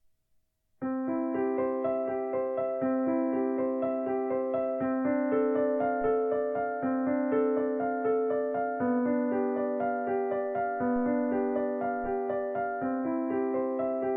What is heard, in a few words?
piano; Prelude Ave Maria (Bach)